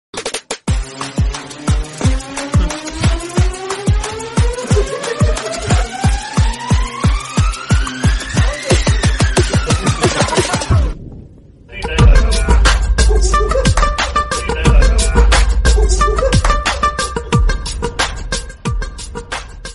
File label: telugu movie bgm ringtone; agnathavasi; pspk